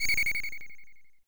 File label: portal; big tower tiny square